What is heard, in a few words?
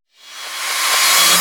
Reverse Cymbals